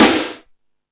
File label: All Snares, E-MU Pro-Cussion; drum machine